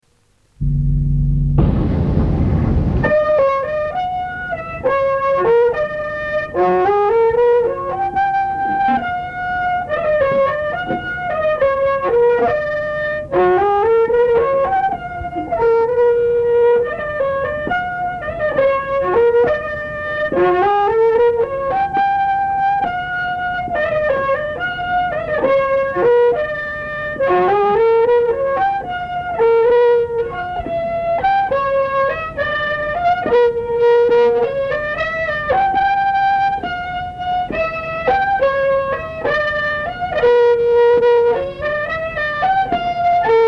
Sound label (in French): valse